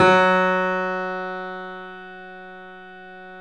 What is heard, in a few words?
3D Pianos Yamaha C7; E-MU Producer Series Vol. 5